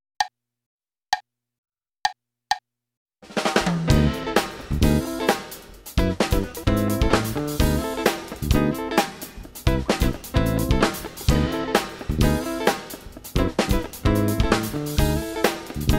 funk groove; bergeron guy

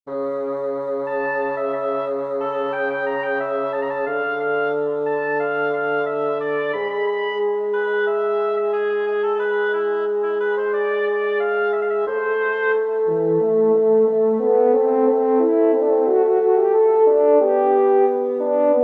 recitative for woodwind quintet; Johann Sebastian Bach; mein aeolus

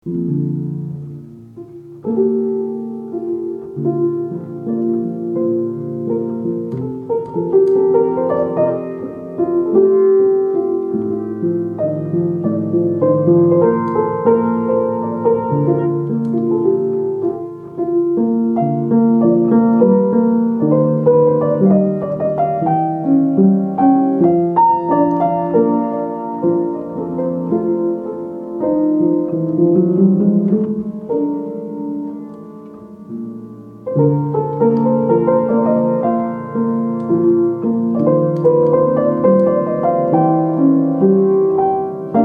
verset per organo; venti versetti

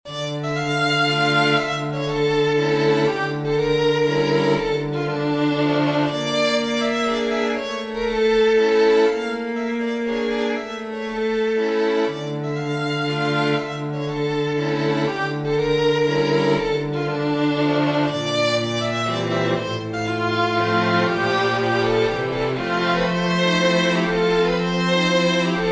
string quartet

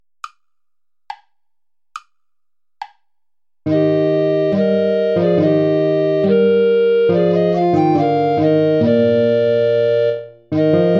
play along without soprano recorder

